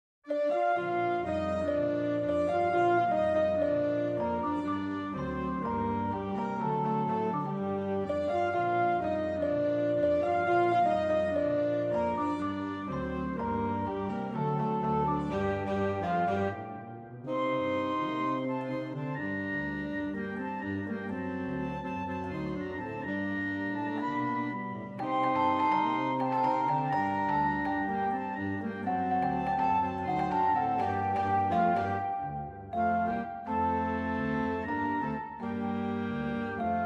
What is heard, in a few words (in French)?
Orchetres Focus Celesta; Les Mysterieurses Cites d'or